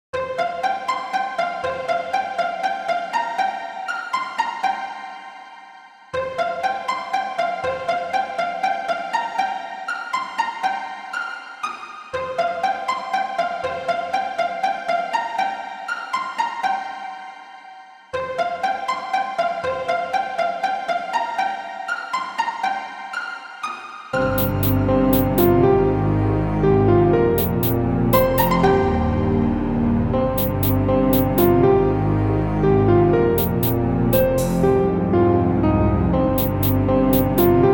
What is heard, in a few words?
waltz